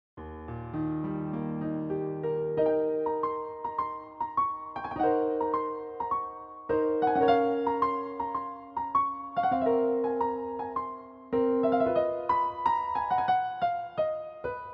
piano roll; Gershwin; Adam Carroll

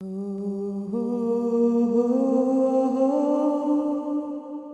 Oh Oh Oh Ohhh

